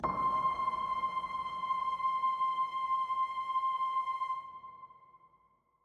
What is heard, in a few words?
ENERGY Harmony Note C5; Cave Music